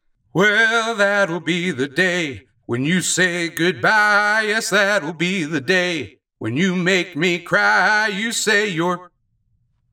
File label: singing waiter